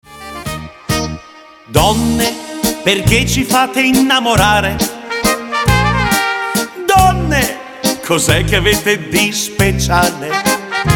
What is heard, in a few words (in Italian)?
fantasia di 4 mazurche